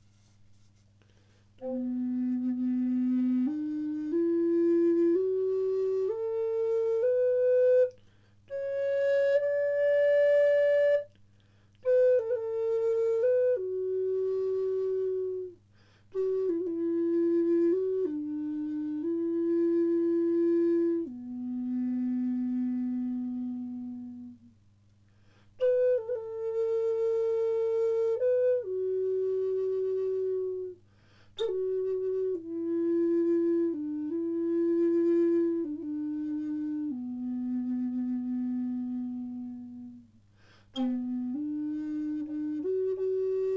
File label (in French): Do grave en résineux